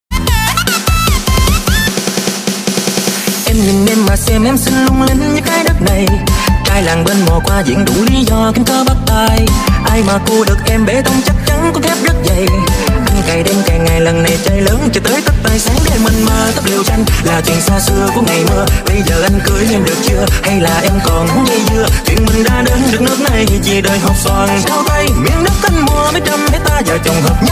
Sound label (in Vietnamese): nhac chuong remix